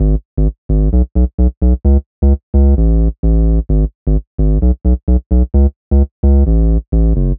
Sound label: Bass Loop 130 Emaj; Bass Loops 130bpm; Downtown House